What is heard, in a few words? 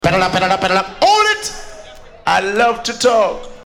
Vocal (Hold it)